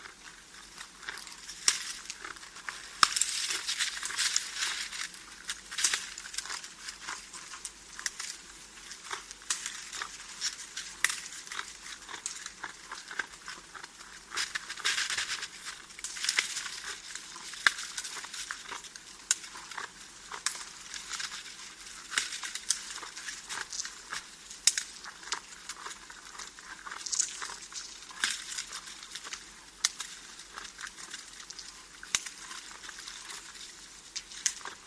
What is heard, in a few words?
moose feeding; soundscapes